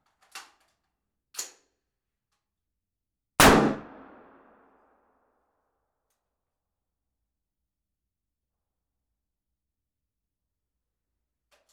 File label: Small Room H&K 416 5.56 Assault Rifle 05m Single Shot x1; Audiobeast; The London Warehouse Firearms Library